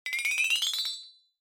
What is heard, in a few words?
flexatone